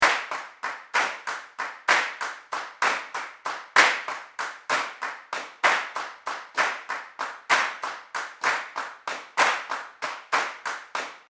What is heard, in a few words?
12/8 clap 96 BPM; 12/8 time